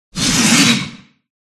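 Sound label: wyvern attack voice bottom; viverna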